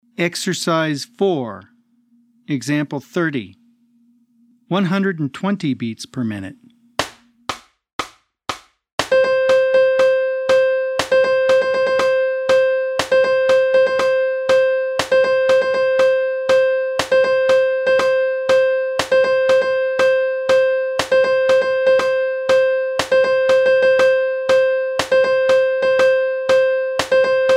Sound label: 120 BPM